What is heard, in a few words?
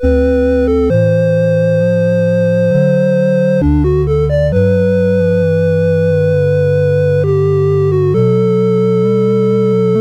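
MOS 8580 SID chip; CSG8580R5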